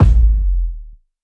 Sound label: long room reverb kick sound A key; Mono